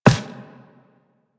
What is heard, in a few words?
Walk Metal, Footsteps; Warframe; Operator